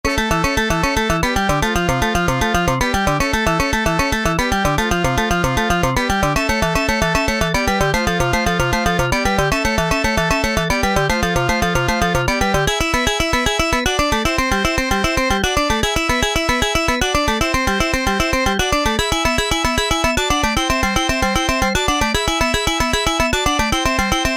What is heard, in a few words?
Dark Forest; Valis The Fantasm Soldier Collection III; Genesis; PC-88; SNES